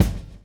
GRV, Groove; AMG Gota Yashiki Groove Activator

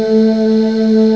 CHOIR, HUMANS; M8; CMI Factory

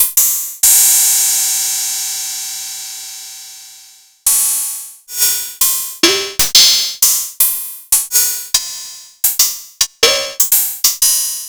ms20 raw cymbals

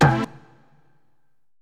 SYNTH Rave; Rave-olution; Polyend; Legowelt

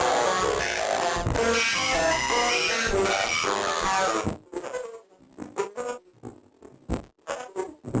Battered Synth; malfunctioning synth samples

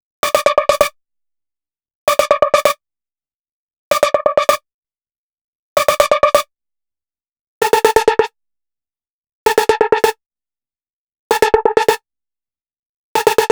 Sinisaw Lead, EDM; Sonic Foundry (Sony Creative Software); Afterhours EDM

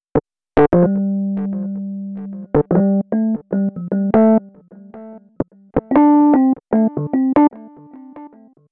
Rhodes Solo, Cinematic Funk; Cinematic Funk Urban Tone Poems; Xotica

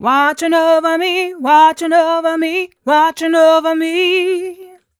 f 126 mel f-sharp, fem Vocals

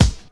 KIT, Dance Mega Drums, HIP; Best Service

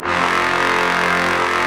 BRS SECMT, Ensembl.mute; Roland L-CD702

